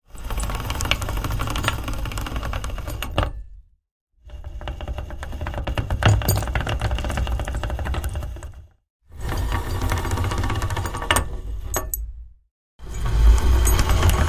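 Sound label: Chain Heavy Towing, chains; Chain, Heavy Towing x4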